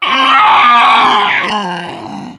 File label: die, voice; charger